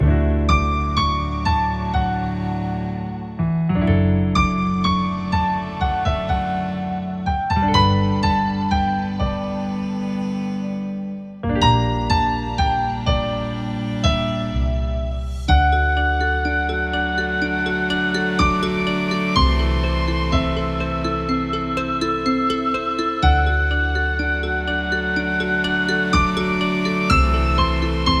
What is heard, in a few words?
Calm